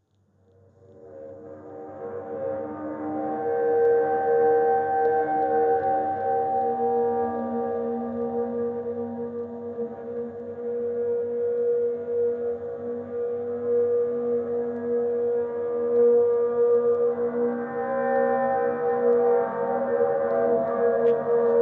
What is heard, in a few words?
clothes dryer Processed, evolving pad; processata con delay, reverberi, pitch shifer, etc, per ottenere una specie di "evolving pad"